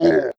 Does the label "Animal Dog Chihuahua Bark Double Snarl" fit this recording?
No